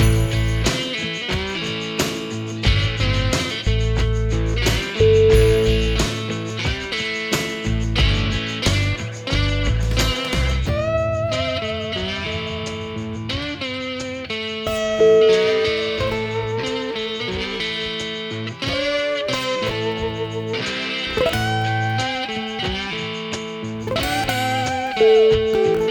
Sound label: southern mojo